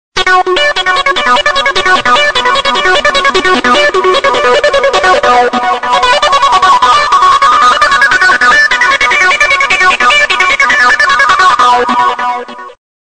Trance Transform Ringtone

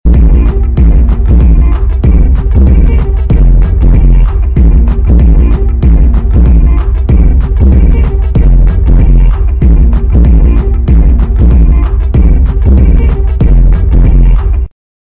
Fresh Loud Ringtone

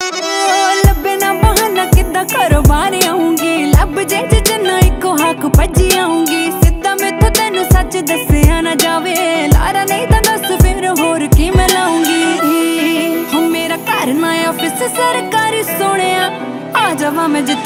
Punjabi Beats Ringtone

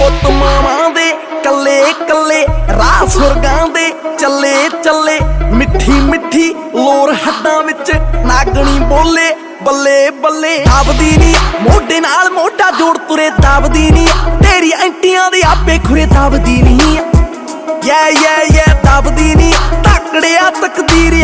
Pendu Punjabi Ringtone

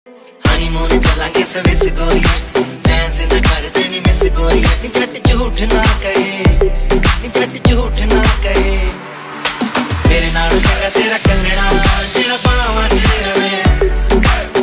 Desi Punjabi Ringtone

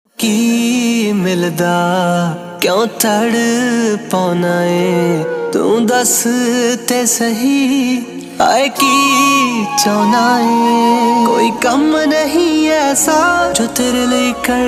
Jatt Punjabi Ringtone

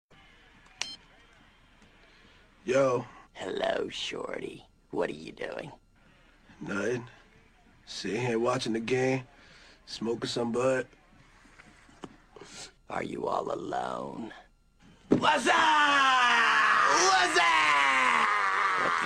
Scary Movie Ringtone

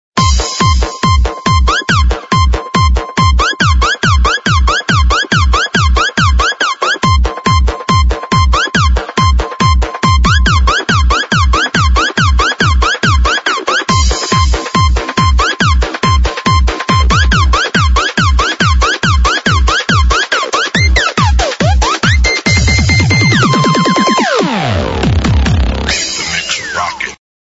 Techno Soul Ringtone

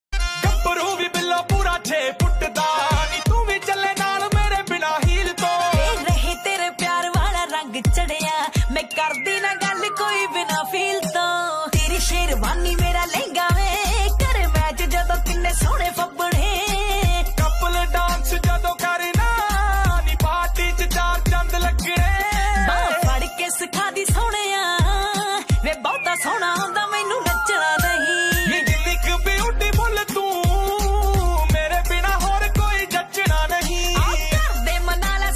Dance Punjabi Ringtone

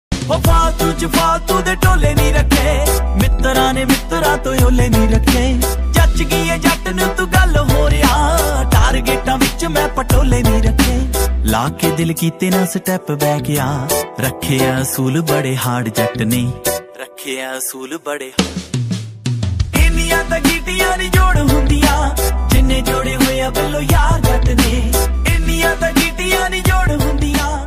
Desi Punjabi Ringtone

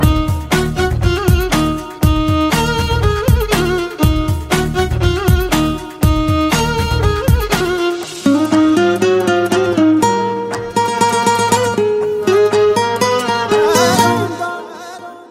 Instrumental Tone, Telugu

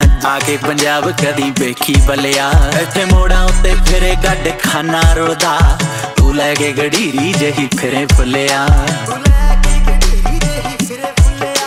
Punjabi Beats Ringtone

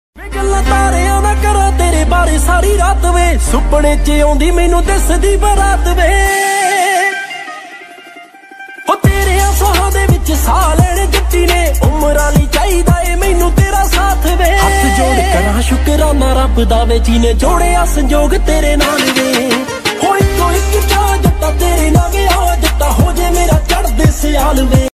DJ Punjabi Ringtone

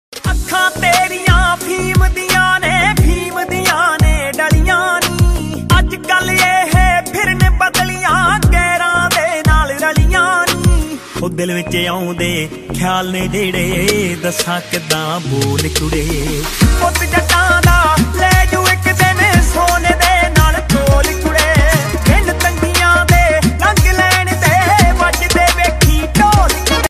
Desi Punjabi Ringtone

